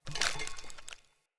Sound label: Foley Step; Enemy; Characters; Corrupted Sproutfolk